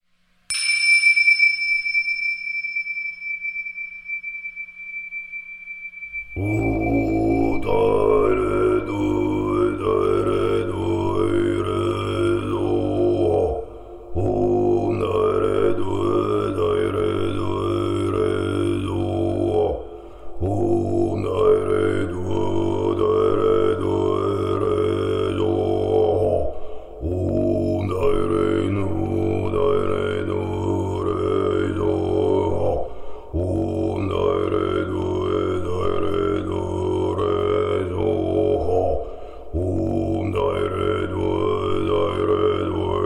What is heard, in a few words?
Tara Mantra